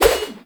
Small Swing Spin, Weapons; Dual Zoren Prime

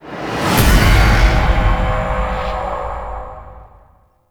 Floor Enter Stinger; Tau; Tau Circles Of Hell

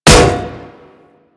Staff Impact Metal

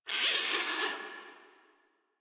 Forest Rodent Vocal Angry Short; Warframe

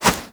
Punch Whoosh

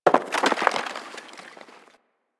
Landscape Ice Slide Medium, Ambience; One Offs; Warframe; Venus Open World

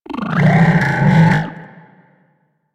Talking Machines; Ambience; Warframe; Corpus Railjack

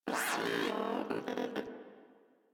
Moa Aggressive Vocal Upgrade Bored; Warframe

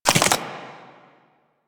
Proto Infested Maggot Step; Warframe

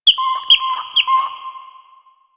Bird Close; Ambience; One Offs; Birds; Warframe; Quests; The New War; Drifter Camp

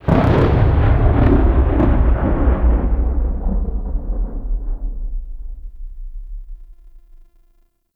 Invasion Thunder; Warframe; Cetus

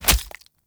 Throwing Knives Impact Flesh; Weapon Impacts; Player; Combat

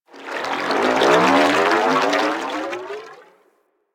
Ocean Cloning Machine Gurgle; Ambience; Props; Warframe; Grineer Ocean

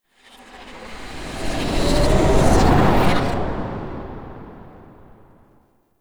Drop Down Whoosh; Warframe; Quests; Apostasy

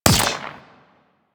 Drone Rifle Fire; Warframe; Amalgam Drone; Weapons

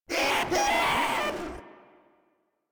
Proto Infested Runner Player Spotted; Warframe

